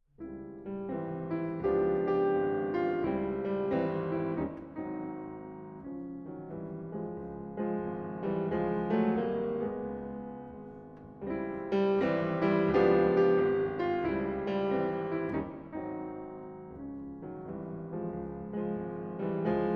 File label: Impromptu in Two Keys